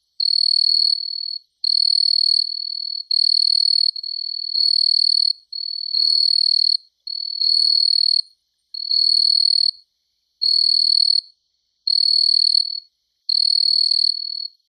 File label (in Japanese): kaya hibari